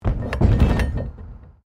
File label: PLASTIC BARRICADE MOVE; Material components for constructing more complex sounds